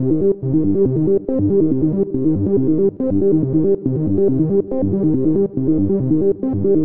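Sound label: 140 bpm C minor synth, Trance Synth Loops; Trance Explosion Vol1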